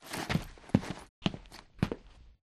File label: grunt run; Titanfall 2